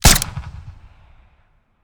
sig p226 suppressed single shot open exterior; soundmorph

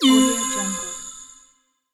holy magic healing spell cast; stormwaveaudio

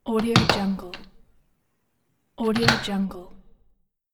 door lock unlock

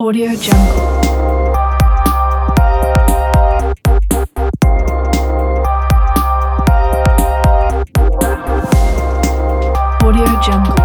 glitchy logo

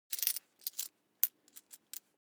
money coins search; foley; smartsoundfx

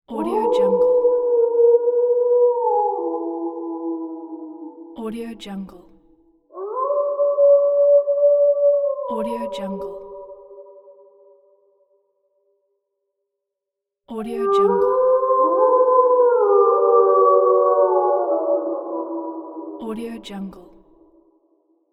wolf howling

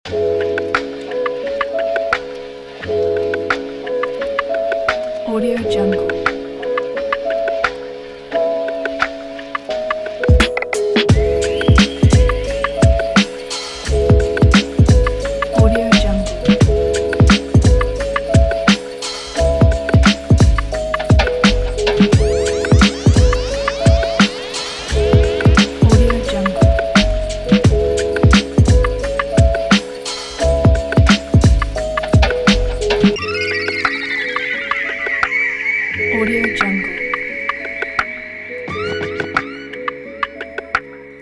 lofi boombap

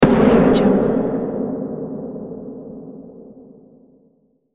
dramatic hit impact boom

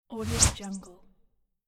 twitter whoosh; audioheart

